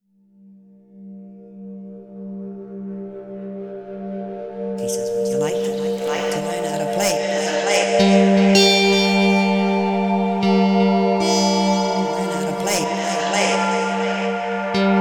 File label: Guitarist